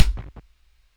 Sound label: HIHAT TOP; DRUM N BASS:JUNGLE BEATS; DRUM N BASS KIT 2